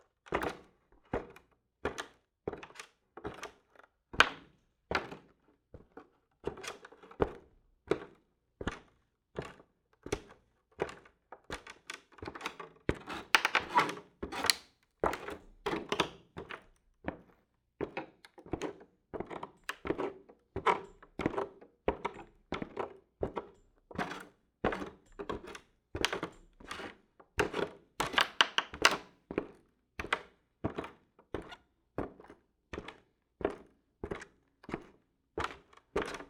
Creaky Wood Boots Walking, Footsteps; Todd Blankenship

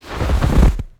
snow footsteps walk deep; Bluezone Corporation

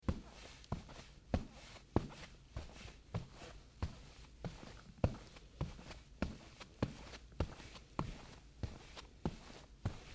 Dirt Walking, Footsteps; Todd Blankenship

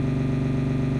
engine drive; simpra

